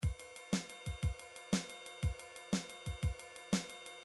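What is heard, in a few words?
drums arpeggio, midi drums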